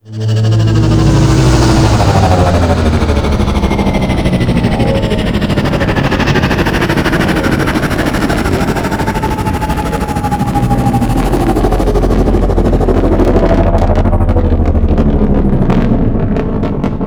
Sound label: Dropship Large Arrive; Warframe; Venus